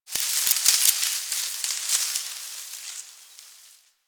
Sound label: Foliage Rustle; Ambience; Warframe; The New War; Quests; Zariman